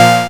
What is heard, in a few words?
Synth Shot; Vengeance Samples